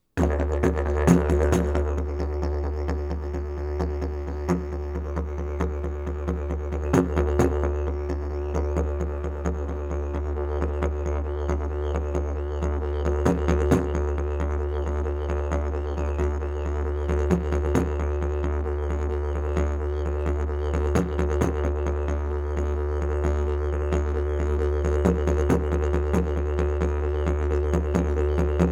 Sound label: didge